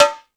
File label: Latin Drums, E-MU Pro-Cussion